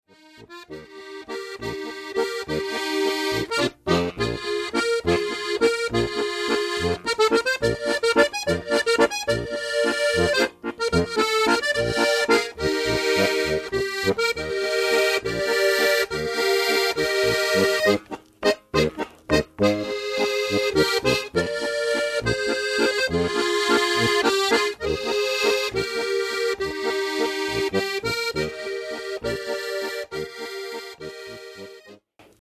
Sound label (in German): Schneewalzer Steirische